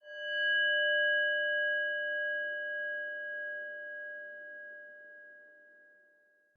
Metallic Rand; Warframe; Clan Dojo; Dojo Randoms; Ambience

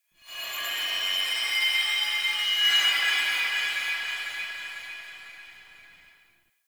Glass Particles; Warframe; Ambience; Nokko Colony

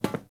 Step Default, Footsteps; Warframe; Friendly NPC

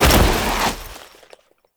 Foley Roll; Warframe; Characters; Kahl

